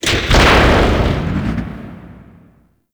Gas City Elevator Start; Warframe; Corpus Gas City; Gameplay Remaster; Ambience

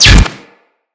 Bolt Impact Metal; Weapons; Cross Bolt

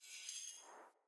Staff Caster Charge Heavy Start; Weapons; Player; Staves; Starter

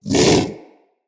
Vocal Bark; Characters; Hunting Critters; Predasite

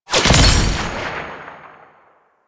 Kill Notifier; gameplay; Warframe; Nineteen Ninety Nine; Quincy

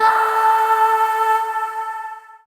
Void Shawzin Oct Two A; Warframe; Zariman

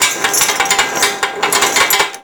Chain Fall, Dojo; Ambience; Warframe; Mod Quest; Teshin's Hideout

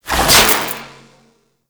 Unequip, Weapons, Sentient; Warframe; Shedu

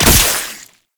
Proj Explosion; Weapons; Warframe; Tenno; Oraxia

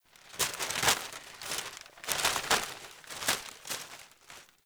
Paper Blowing; Warframe; Ambience; Grineer; Carnival

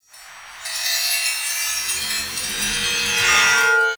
Backstab Blades Hover Circle; Warframe; PaxDuviricus

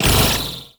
Briefcase Scythe Proj Bounce; Warframe; Corpus

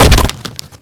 Bolt Impact Torso; Warframe; Cross Bolt

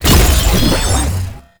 Infested Lich Virulence Cast; Warframe; Abilities; Zeke

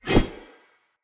Long Sword Whoosh; Weapons; Player; Swords; Pothia

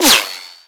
Bullet Whizzby; Warframe; Weapons

